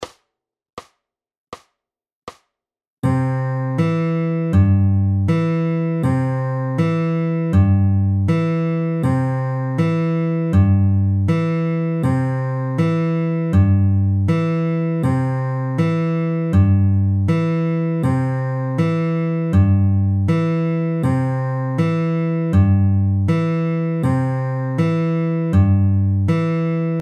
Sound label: C Trav, Note, SLOW, fingerpicking